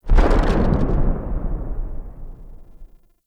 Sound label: Centerpiece Start Move, Ambience